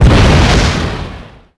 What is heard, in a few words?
Small Exp, Explosions